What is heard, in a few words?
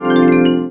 Remindr; office